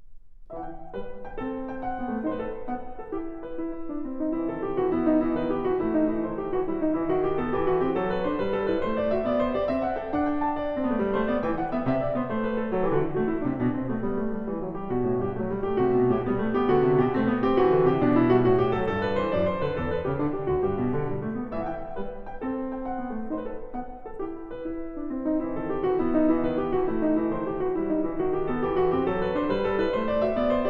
Partita in E minor, Tempo di Gavotta; Partita No. 6; BWV 830; Bach

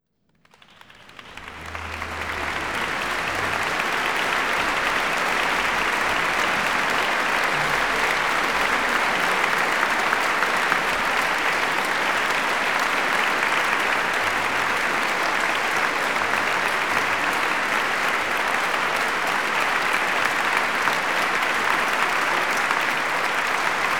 Applause; Symphony in D major, Hob.1-104 'London'; Sergiu Celibidache; Haydn

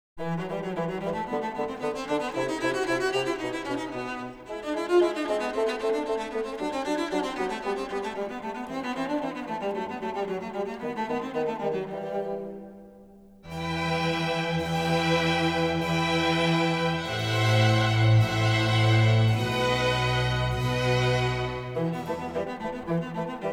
Cello and Bassoon; Allegro; Concerto; RV 409; Vivaldi; Concertos for Cello

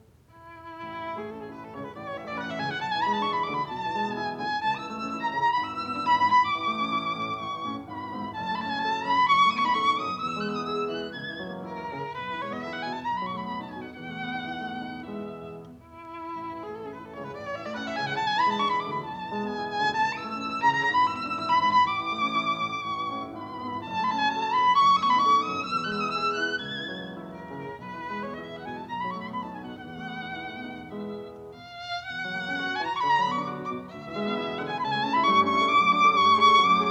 Sonata for Piano and Violin in D major, Variation; Violin Sonata No 1; Beethoven

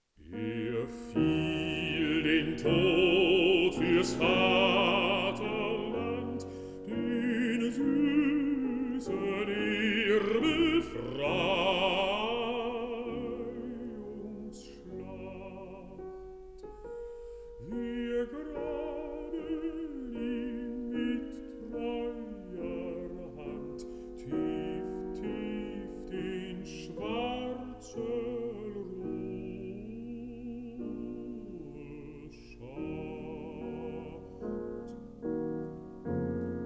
song for voice & piano

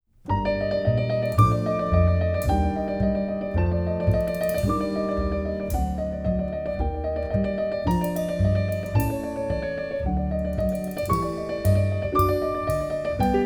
Variations, for keyboard

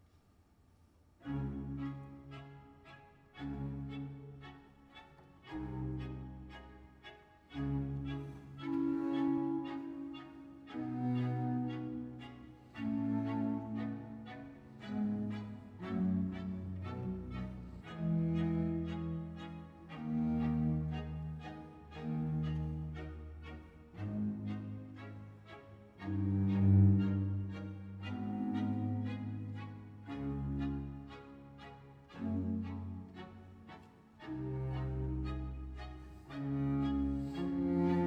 Concerto Grosso in F major; Corelli

